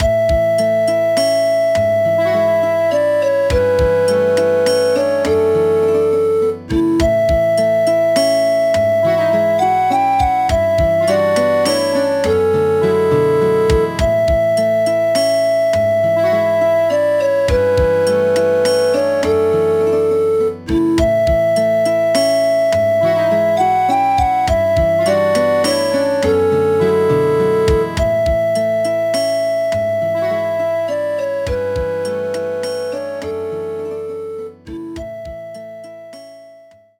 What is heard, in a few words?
BGM; Londonian Gothics Meikyuu no Lolita